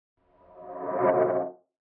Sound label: Dark Magic Movement; SoundsCrate